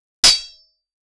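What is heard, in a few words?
fantasy fire sword clashing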